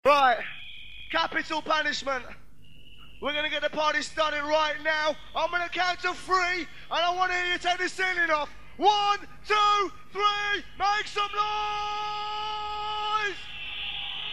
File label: live @ dance trance